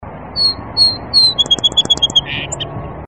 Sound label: Song Sparrow